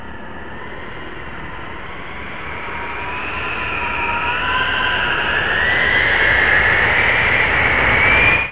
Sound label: teleport mechanism windup; Half Life Model Pack; Half Life 2; ambient levels